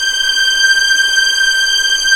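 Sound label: G6 legato F L, string; East West; The Ultimate String Collection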